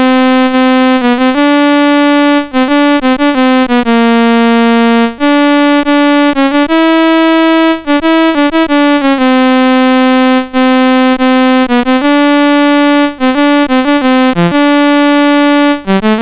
MOS6581R4, GAMES; Video Darts; HVSC

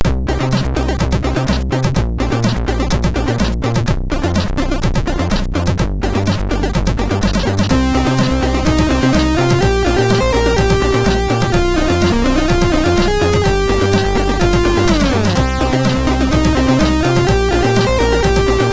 Sound, MOS6581R2

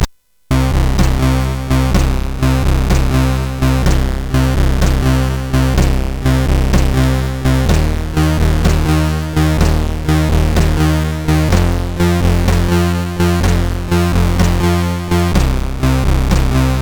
Metal Decay, CSG8580R5; sid

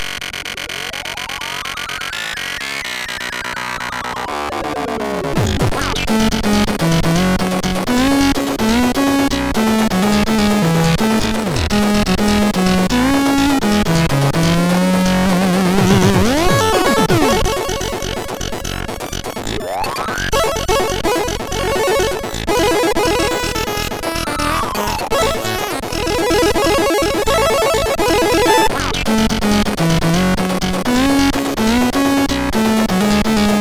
Rage Quit Loop, MOS6581R3

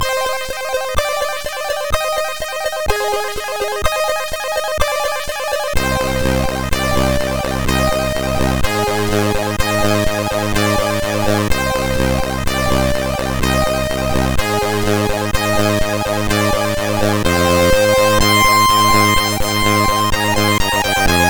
Music, sid CSG8580R5

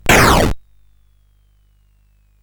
Frantic, CSG8580R5

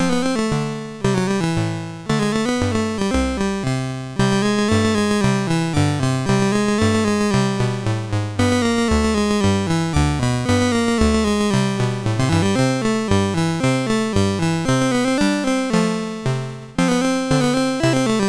sid MOS6581R2, GAMES; Face Off

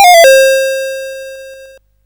Buggy Boy, CSG8580R5

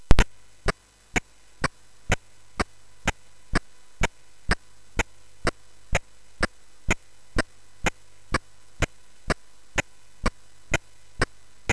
sid MOS6581R4, GAMES; Dark Caves

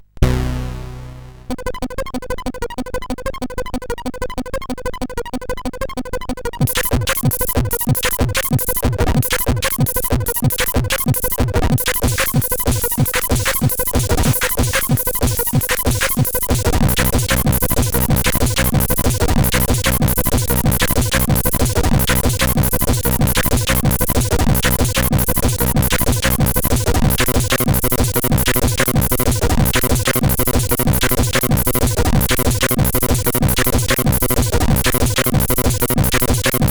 Intromusic, sid CSG8580R5; hvsc

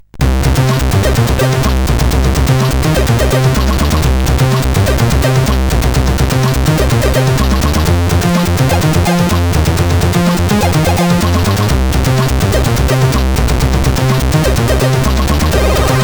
intro sid CSG8580R5

